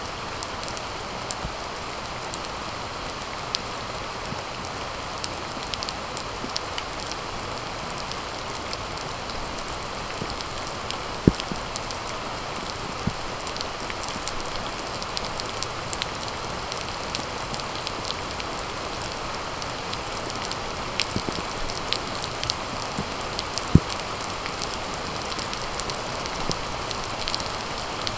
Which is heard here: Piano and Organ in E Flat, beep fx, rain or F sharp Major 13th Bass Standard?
rain